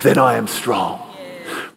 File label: Vocal Phrases; Vengeance Samples; Vocals